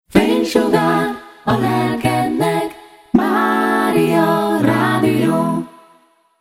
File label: acapella; Toltelek musorok